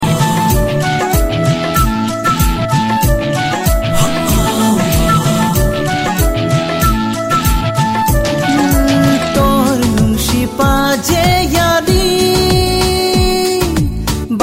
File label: Melody, RingTune